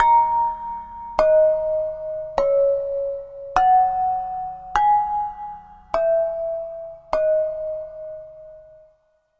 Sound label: Ceramic Bells; Ambient; Melodic; Ambient-Melodics